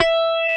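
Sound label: GUI, acoustic guitar; Sound & Vision; Gigapack I CD 2; GUI Acoustic 1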